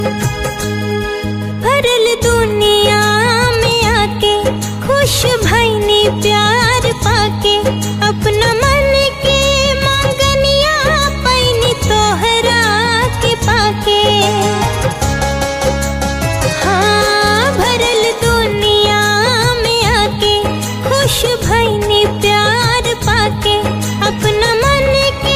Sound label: BHOJPURI RINGTONE